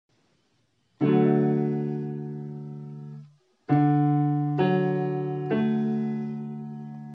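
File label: re minor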